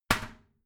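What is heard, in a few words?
toilet seat lid slam regular, bathroom; haus-garten; badezimmer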